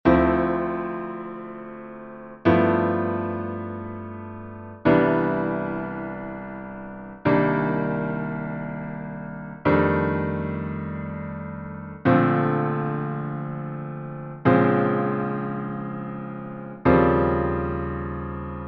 II V Is Descending Steps, Piano

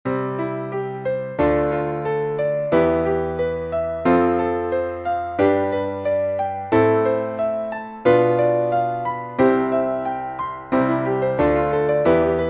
Arpeggio Warm-Ups, Major Keys, Piano; Arpeggio Warm-Ups 1: Major Keys 1-6

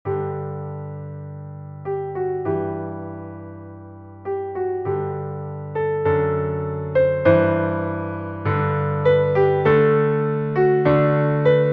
Piano; Beginner

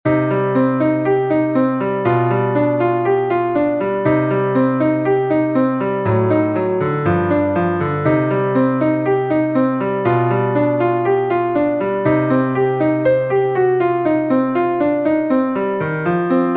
Jazz Exercise

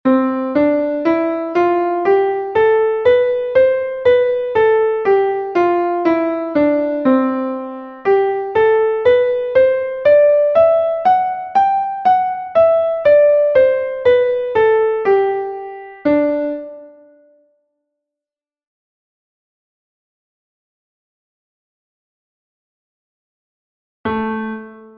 4-Note Scales Building Heptatonics

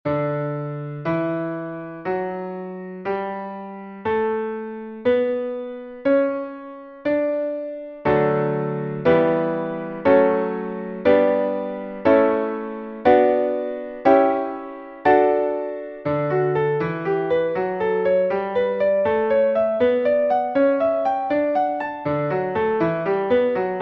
Chord Shapes, Basic Scales And Triads, D

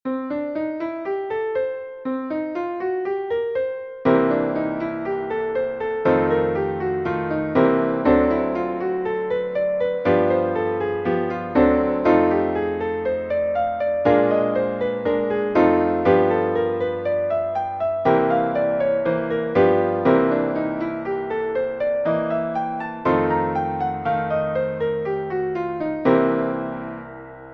Blues Scales Up and Down, Piano Warm Ups

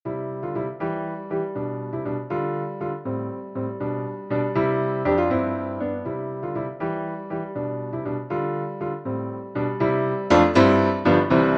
Melody Easy Piano; Mozart; SR Book 1

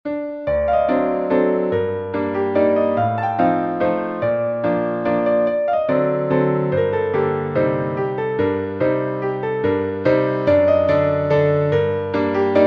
Mazurka In G Minor; Chopin

